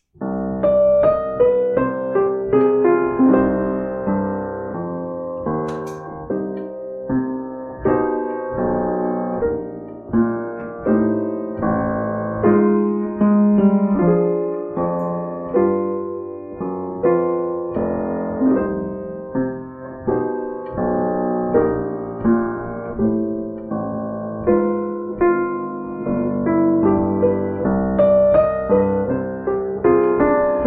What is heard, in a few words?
Sax Piano